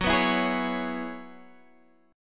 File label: Gb 7th Suspended 2nd Suspended 4th Gb